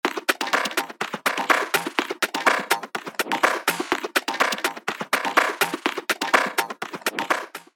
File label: percussion loops; Tagavaka x Basic Wavez